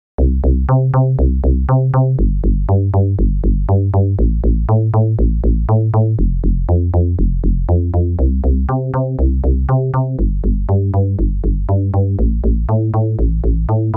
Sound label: Classic FX FM Synth Bass Studio D Chorus